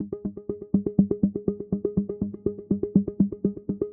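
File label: 122 BPM Sequence D major C minor Tonic D; innovative sequences 1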